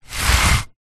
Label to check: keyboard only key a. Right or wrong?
Wrong